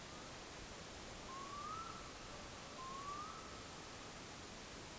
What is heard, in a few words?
Gavia arctica